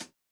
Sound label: Hihat; Reference beat bpm95; urban grooves; Rock